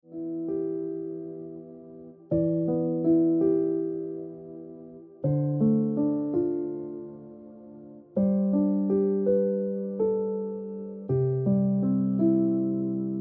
Ringtone Piano Music

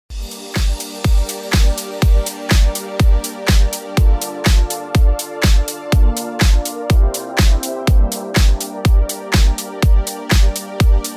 Ringtone Deep House Music

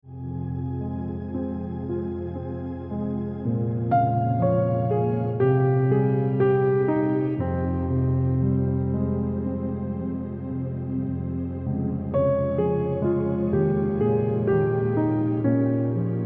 Piano Music

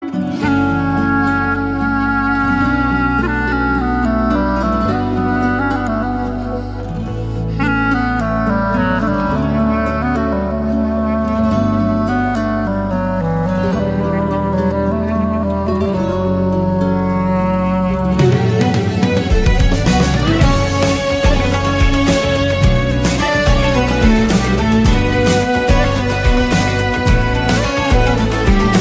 Turkish Ringtone Turkey Ringtone

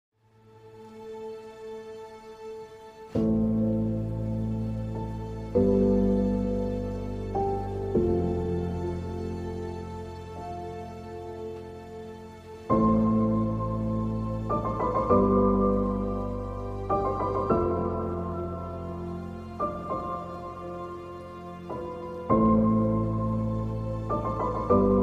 Turkish Music Turkey Ringtone

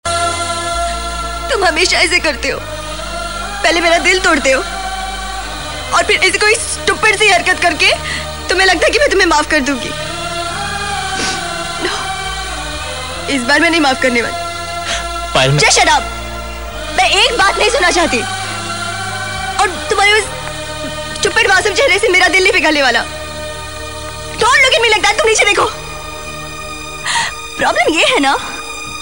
Sad Pakistani Ringtone

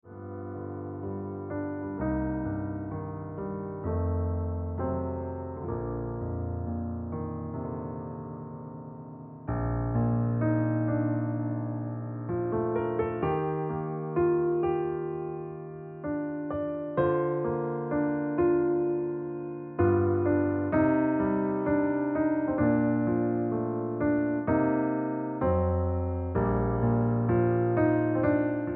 Piano Music

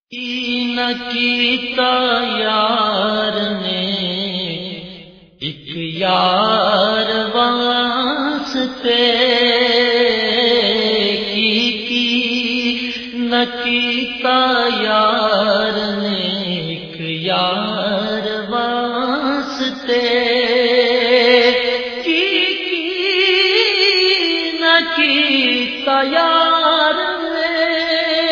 Naat, Pakistani

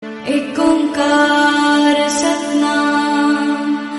Ik Onkar Satnam Singing Ringtone

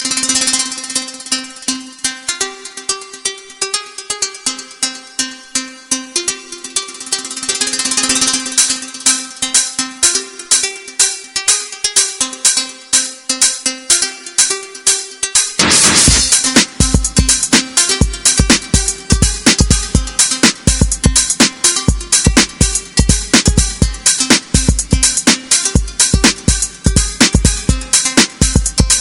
Bucheki Pakistani Ringtone

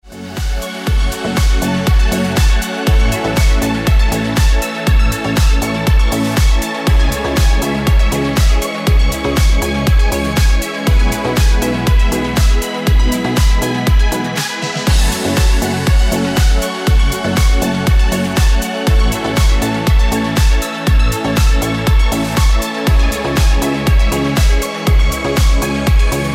Ringtone

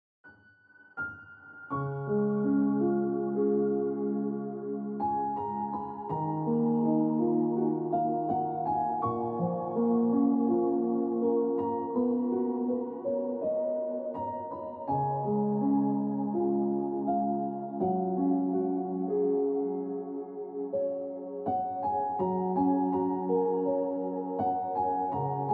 Ringtone Piano Music

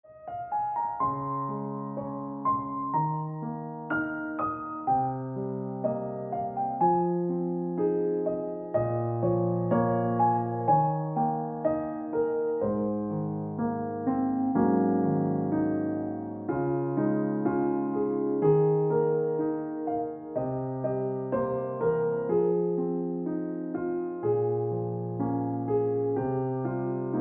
Ringtone Piano Music

